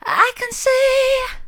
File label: i can see soft, vocal